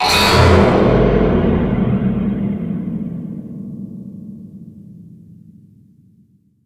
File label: fear